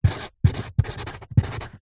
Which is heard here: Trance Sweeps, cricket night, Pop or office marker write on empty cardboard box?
office marker write on empty cardboard box